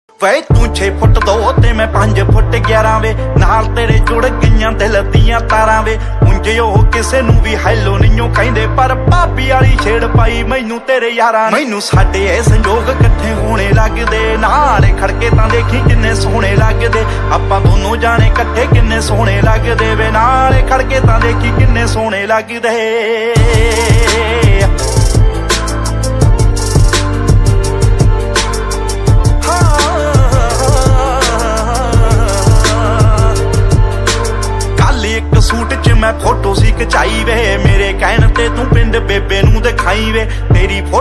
song ringtone punjabi song ringtone

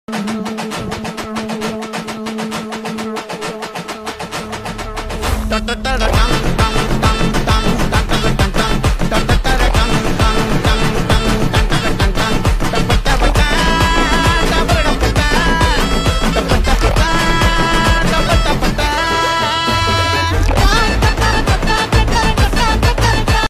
song bgm, telugu; kalki; prabhas

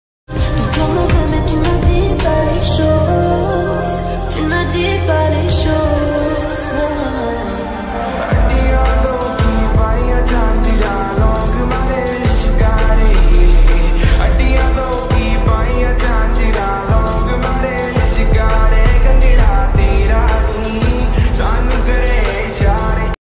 romantic ringtone; 𝙻𝚘𝚏𝚒 𝚛𝚎𝚟𝚎𝚛𝚋

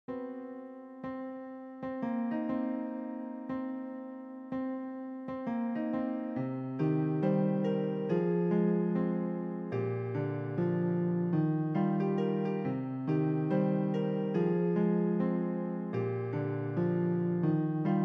bgm piano love theme; siva manasula sakthi

